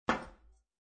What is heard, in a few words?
metal